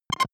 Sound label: button, signale; soundpool